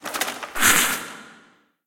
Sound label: attack spell; Fate The Cursed King; MONSTERS; PALACE GUARD